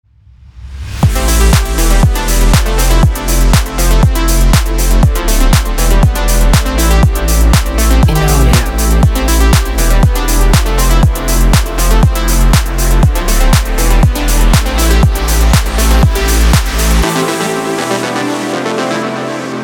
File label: soft sand logo